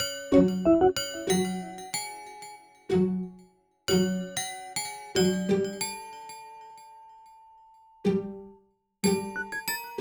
Melody

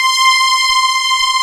Textural Strings

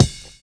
SNAP; Univers Sons - Kitch 70s

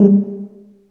TUBA, Tuba stacc; Roland L-CDX-03 Disk 2

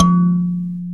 SANZA 2 F2; AKAI S-Series CD-ROM Sound Library VOL-5

